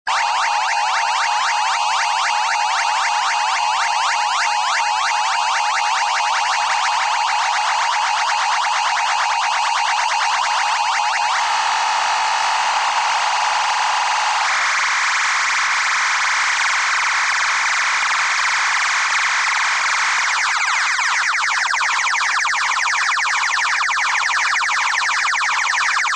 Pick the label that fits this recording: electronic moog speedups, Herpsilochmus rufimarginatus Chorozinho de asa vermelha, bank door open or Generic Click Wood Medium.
electronic moog speedups